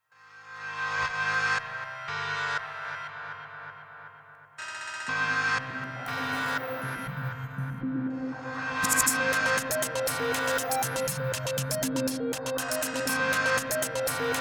With Cello; David Yousefi; Belonging

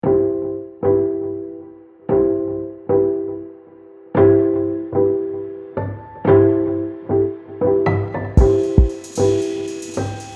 Bow Tremors; Mute Beats

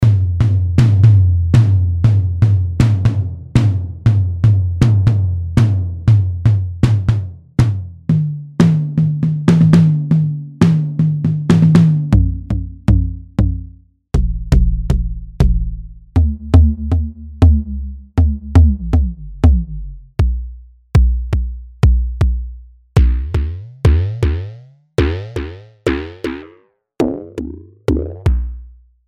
Solo Tom Module; MASCHINE 2.0

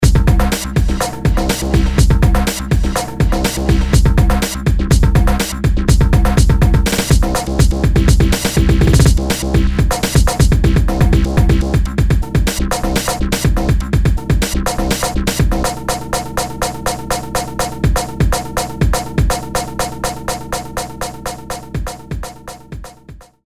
FX Beatmasher; TRAKTOR PRO 2

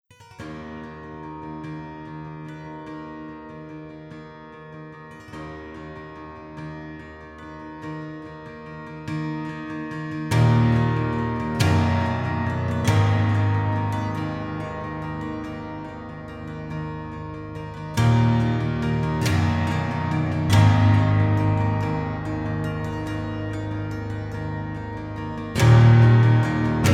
plucked piano; tonehammer; teaser

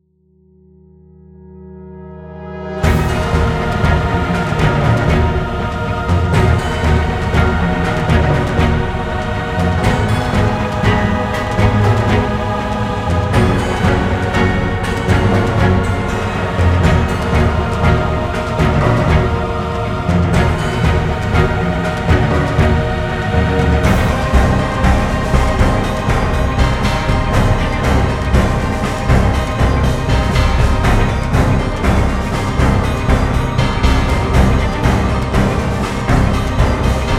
Grand Bells

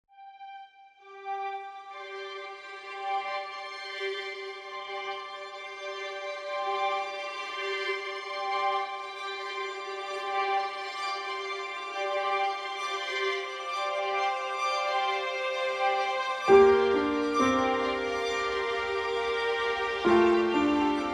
Rhythmic Textures

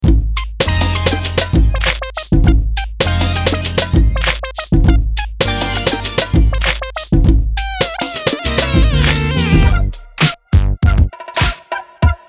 Marble Rims Kit Medley

